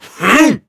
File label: monster attack